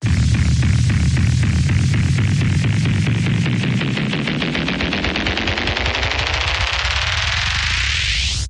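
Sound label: Rise Rhythmic Alien Abduction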